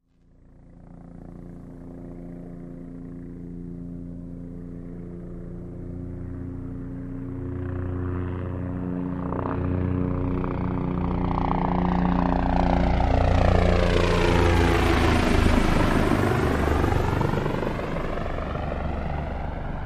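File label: Apache Heli Pass Over; AH-64 Apache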